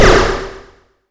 Retro Weapon Laser

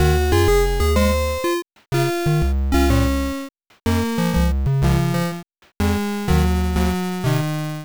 Retro Music Loop NES Style

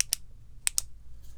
Flashlight Clicky

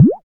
Blob Richochet; FREE SFX PACK VOL 1

